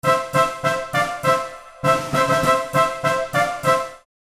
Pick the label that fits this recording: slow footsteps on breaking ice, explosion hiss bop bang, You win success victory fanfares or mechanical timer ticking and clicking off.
You win success victory fanfares